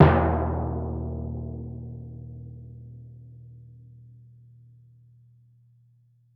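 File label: timpani fortissimo E1; Best Service; Peter Siedlaczek's Advanced Orchestra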